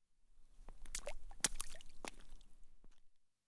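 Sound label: small stone fell into water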